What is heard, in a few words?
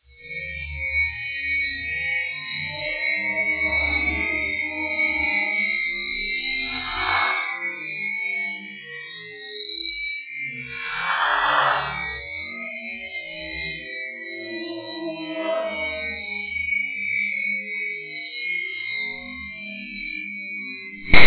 E.V.P. "moaning" with noise filter; graveyard